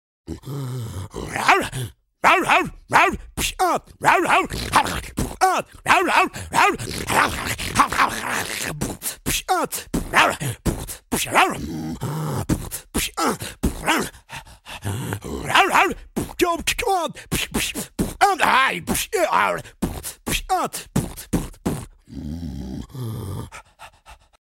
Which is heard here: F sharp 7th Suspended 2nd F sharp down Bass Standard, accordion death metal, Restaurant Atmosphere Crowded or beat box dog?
beat box dog